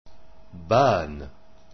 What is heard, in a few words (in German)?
bahn